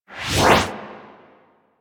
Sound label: cast smoke screen; Warframe; Warframes; Ninja